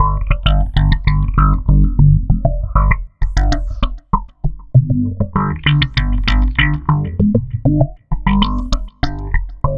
Processed Slap Bass, Electric Bass; Sonic Foundry (Sony Creative Software); Leo Cavallo Bass Taster

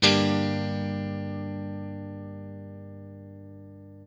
CHORD LN 7, guitar; Quantum Leap - Guitar & Bass

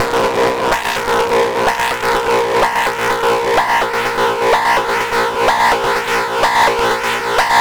rank emergency Bb 126, synth loop; Synth Loops; Zero-G; Soundclash Nu Dance Electro; Acidized; 126bpm

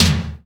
TOM LOW SIMM; Processed Kit 2

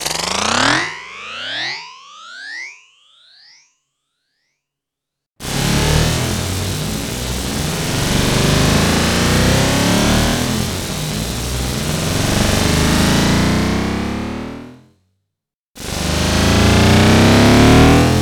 Risers

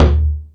Jungel Percussion, E-MU Pro-Cussion; drum machine

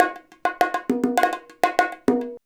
BONG, BONGO 100, Modern Percussion Loops; Soundscan vol.56; AKAI

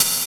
snare+hat; DRUMS

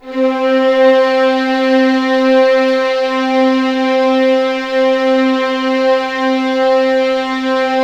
C4, String Collection; East West; The Ultimate String Collection

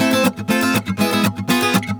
GUITAR SALSA

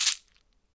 Afro Shakers, Shekeres; Roland LCDP14 Africa VOL-2

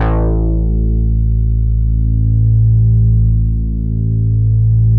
MEMORYMOOG

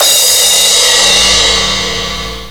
CYMBALS, Disco House

